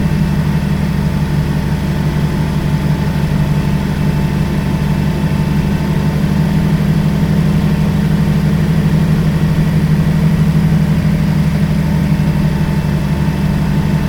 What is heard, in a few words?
Seat Ibiza III 1.2 12V engine 3k rpm loop; mono; Soundholder